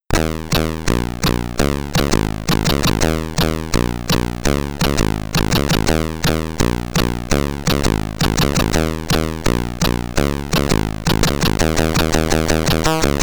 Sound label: SID Stereo; Alien Syndrome; C64